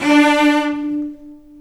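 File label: D3 arco long cello, right; East West; The Ultimate String Collection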